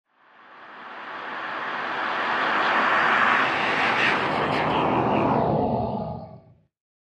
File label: Animal Growl; Eerie And Reversed, With Reverb. Use For Monster.